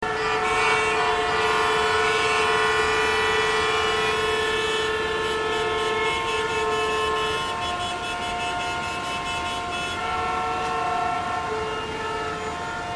car in street

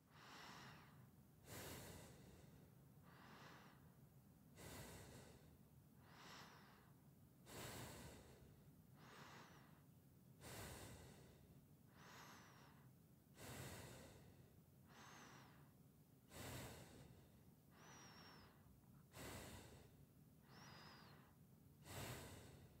Breathe through nose

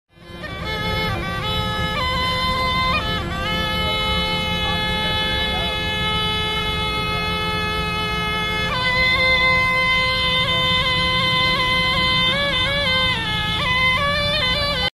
snake flute

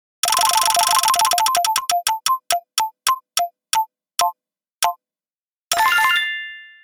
wheel of luck

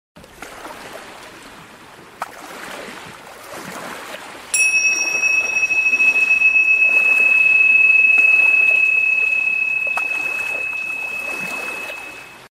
tingsha

